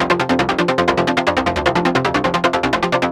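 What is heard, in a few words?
fast, fast sequence; Music Store; Yamaha VP-1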